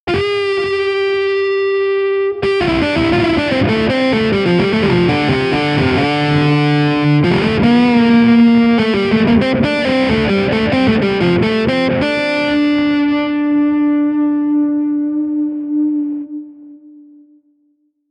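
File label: Guitar Overdrive Riff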